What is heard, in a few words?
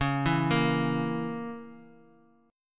Db Minor 6th Db down; Dbm6 arpeggiated; JGuitar